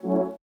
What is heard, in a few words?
F Synths, One Shots; Keys & Synths; Touch Loops; BlissedOutBeats